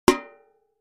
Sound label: Impact Metal Bucket; barrels buckets & cans